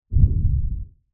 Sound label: Monster Footsteps, Horror, Monster, Footsteps, Lfe, Hit; Monsters; BlastWave